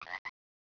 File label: Croack, frogs